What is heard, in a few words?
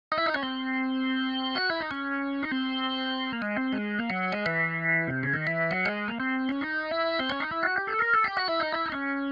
Organ Solo; music; Final boss